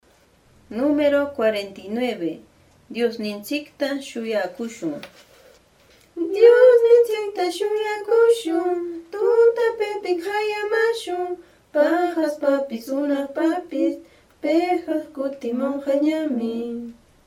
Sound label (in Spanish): cancion